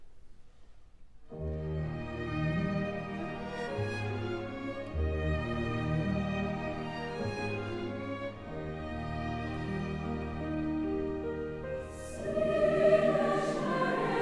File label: Wedding Chorus; In Windsor Forest